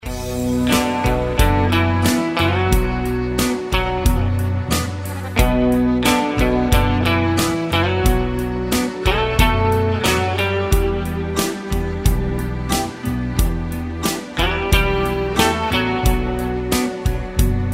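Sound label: instrumental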